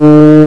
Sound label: cornet